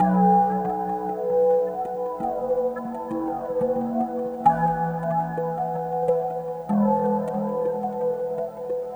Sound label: Atmospherikl; Sonic Foundry (Sony Creative Software); Synchro Funk